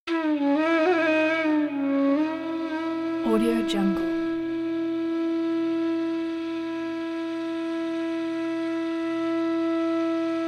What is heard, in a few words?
indian flute music instrumental